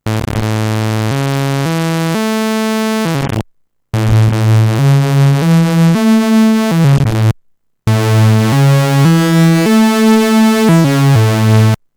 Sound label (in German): minimoog oszis